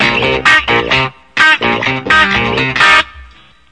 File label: guitar scratching; GUITAR LOOPS